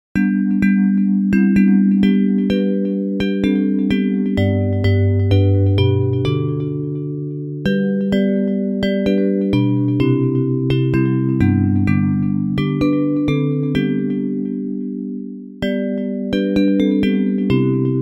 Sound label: Bells